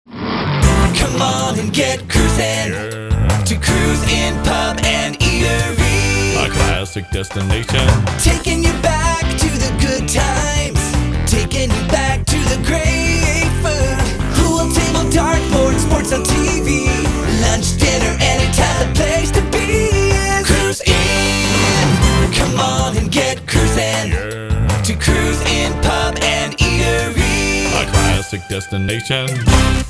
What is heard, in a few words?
Pub Eatery 50s; Cruz In